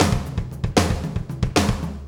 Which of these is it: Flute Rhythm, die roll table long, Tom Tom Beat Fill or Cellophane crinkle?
Tom Tom Beat Fill